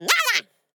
Otter voice

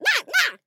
Otter voice

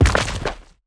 Otter death impact

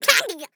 Otter hit

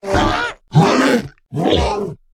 Big Death Drop Voice

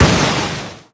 Flare Gun Shoot

